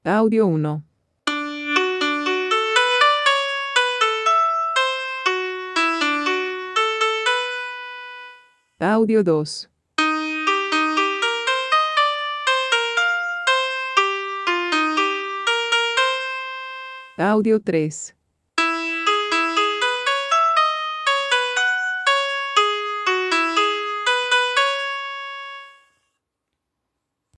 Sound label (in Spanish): tonos